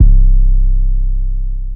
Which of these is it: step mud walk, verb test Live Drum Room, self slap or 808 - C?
808 - C